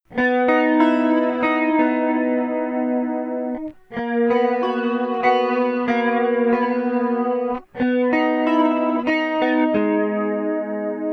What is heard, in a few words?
Arp EG tr Gtr Only